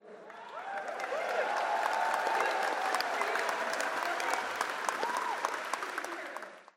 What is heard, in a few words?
crowd; dota; spectator